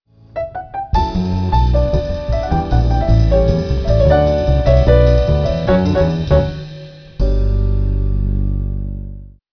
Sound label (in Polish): dzwiek brak aliasingu